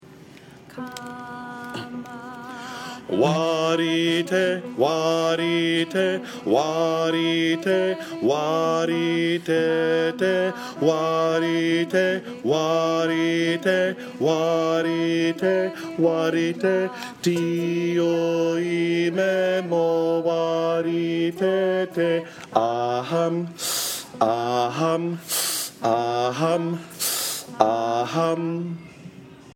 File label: Bass; Prince William All-County